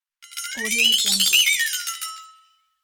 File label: cartoon funny flexitone rattle